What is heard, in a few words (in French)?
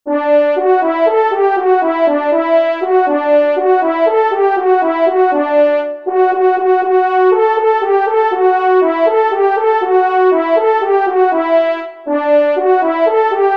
Loup Solo, trompes